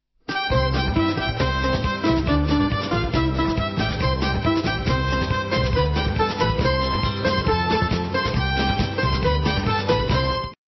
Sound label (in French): ne pleure pas jeanette instrumental